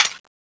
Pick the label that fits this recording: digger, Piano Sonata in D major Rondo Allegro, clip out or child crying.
clip out